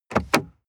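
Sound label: sports car interior glove compartment open short; verkehr-transport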